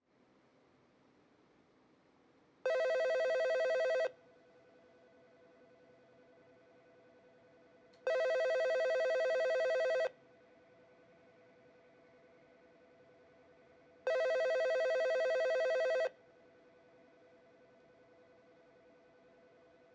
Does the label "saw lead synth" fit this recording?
No